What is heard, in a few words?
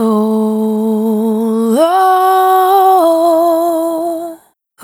Single Voice Melodic Loops